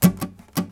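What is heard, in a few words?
5 Part Chucka Guitar 83; Mo' Phatt; Digital Sound Factory Mo' Phatt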